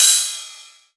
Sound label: Rides, Cymbals; Vengeance - Essential Clubsounds Vol.5